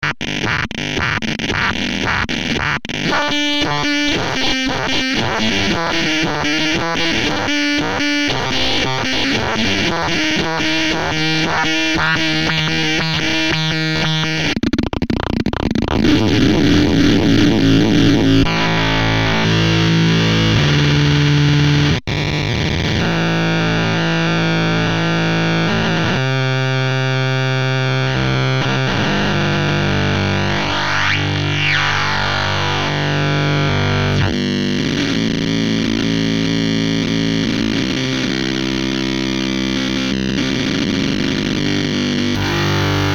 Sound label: phaser